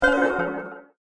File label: Echo Bell Decay; Bell Sound Effects; horror sound effect; Andrew Fleming